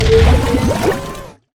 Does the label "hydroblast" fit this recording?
Yes